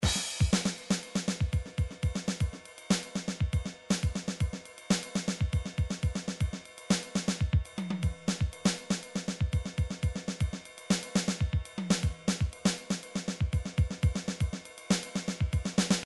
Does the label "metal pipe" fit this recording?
No